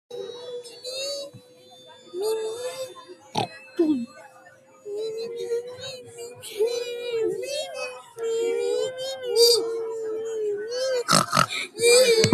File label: nyee song